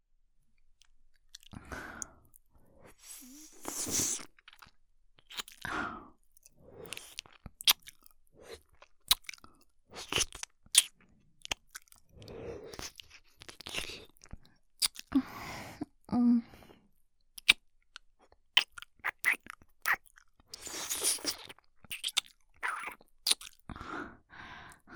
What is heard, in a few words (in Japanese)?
耳舐め